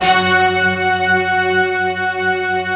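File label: staccato orchestra, F#4; Best Service; Advanced Media Trax Modern Composer; STACCORCH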